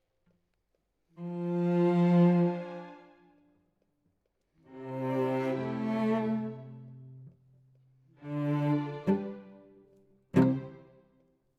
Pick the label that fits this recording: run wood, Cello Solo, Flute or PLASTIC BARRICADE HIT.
Cello Solo